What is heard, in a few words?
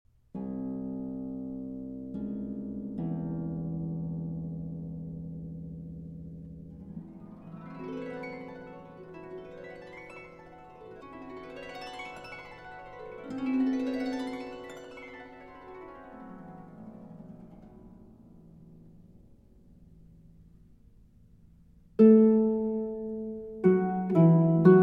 Suite For Harp, Op. 270 V. Allegro; Hovhaness